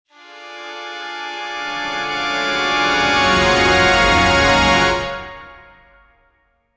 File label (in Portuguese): cordas aceleram em cresc; divine light